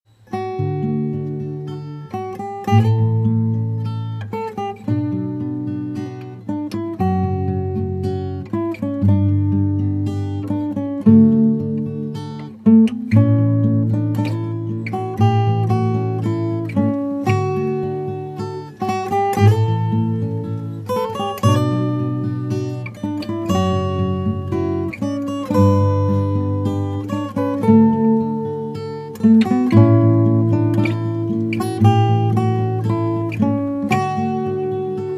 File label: string jar lilt